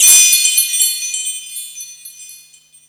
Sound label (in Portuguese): sinos de natal efeito sonoro